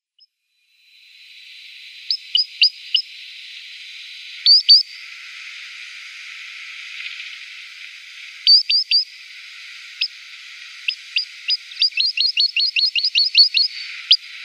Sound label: Solitary Sandpiper calls